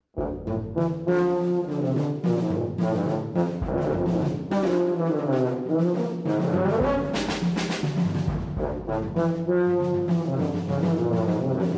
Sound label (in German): tuba und perc